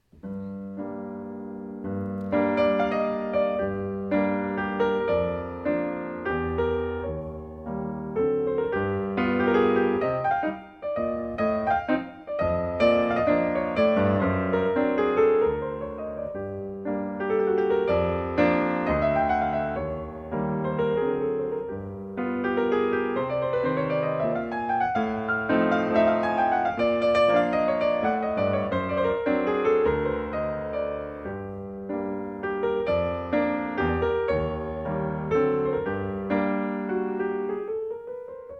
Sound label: piano puzzler